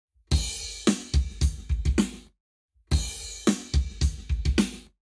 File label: Lab Wet Drums 0to360deg